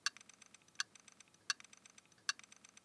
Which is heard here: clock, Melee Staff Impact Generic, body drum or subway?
clock